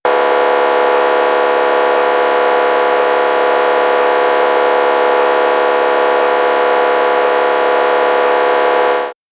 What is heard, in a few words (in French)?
Radio mobile professionnelle et emetteur recepteur bidirectionnel TETRA; Ondes électromagnétiques et électromagnétisme